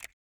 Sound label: button Hover; netent